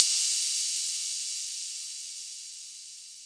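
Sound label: super long cut through open hi-hat; Hats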